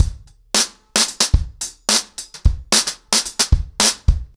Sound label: 110BPM, ACOUSTIC DRUMS; The Roots Drumloops